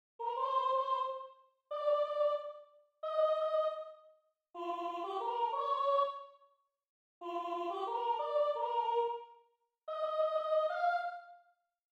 soprano; bars 41 - end

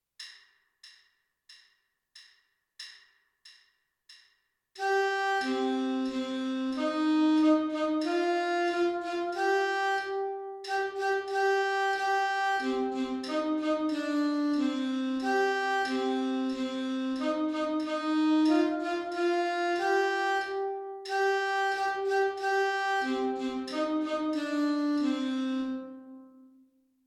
alto sax

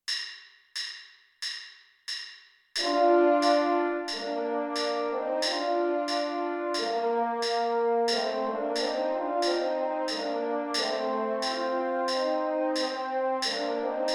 french horn

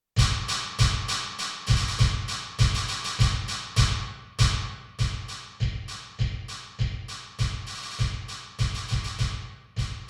snare drum and bass drum; the royal drummer

